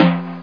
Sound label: (G#7) Timpani; X-Wing Demo; X-Wing Patches